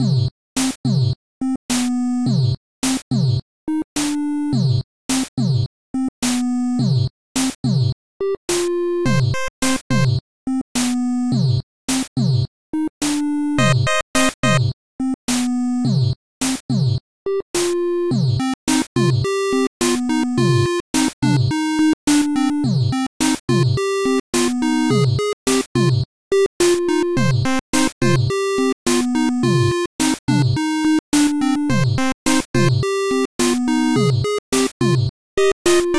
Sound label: mus menu